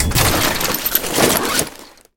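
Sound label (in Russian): жуткий грохот падающих боеприпасов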